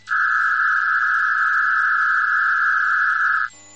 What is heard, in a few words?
Robust packet; BME280 Arduino